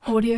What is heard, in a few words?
male breathing various irregular breathes; human sounds